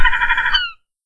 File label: seagull Chatter CHUGUGUG